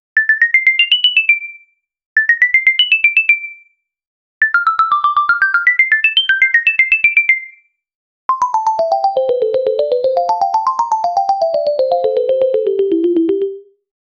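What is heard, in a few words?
logo jingle; cartoon network; advertising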